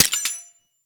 Mech, Weapons; Gotva Prime

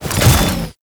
Flak Cannon Empty Clip; Warframe; Weapons; UT Weapons

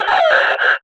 Death; Warframe; Lasrian; Battle Barks

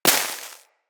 Bullet Impact Debris Snow; Weapons

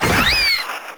Proto Infested Maggot Attack; Warframe

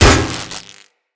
Electro Prod Hit; Weapons

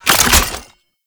Grenade Launcher Reload; Warframe; Scaldra Grenade Launcher

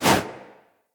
Generic Weapon Light Aim Transition In

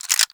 Bow Fully Charged; Warframe; Weapons; Tenno; Daikyu Bow

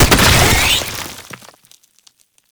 Gestating Tumors Spawn; Gameplay; Warframe; Nineteeen Ninety Nine; Deep Archimedia

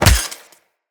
Melee Fist Impact Robotic Flesh

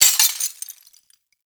Desktop Glass Destroy; Warframe; Entrati; Ambience

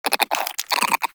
Vocal Idle; Characters; Critters; Warframe; Cryptilex; Hunting Critters

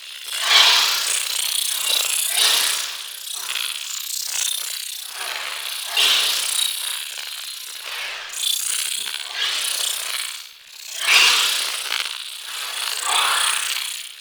Fan Energy Trench Loop; Warframe

